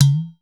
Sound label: udu